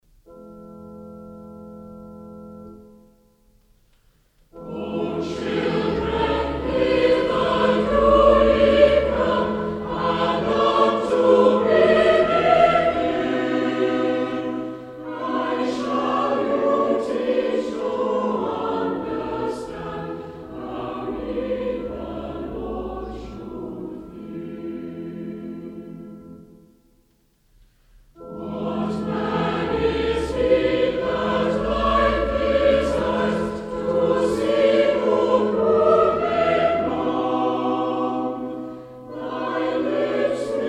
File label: o children hither do ye come